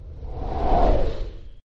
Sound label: slowmo; free rider hd